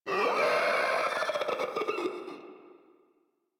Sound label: Infested Runner Death; Warframe; Runners